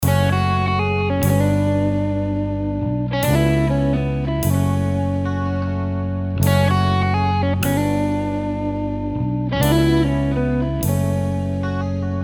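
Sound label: electric guitar progressive fusion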